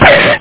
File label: car stop